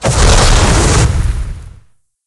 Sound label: breath fire